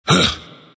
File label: grunt; dota; ember spirit